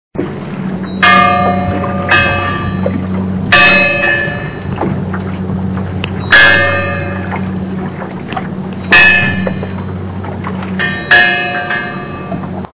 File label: Bell Buoy